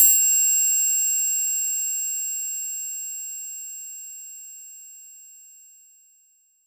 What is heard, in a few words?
Triangle; Roland M-OC1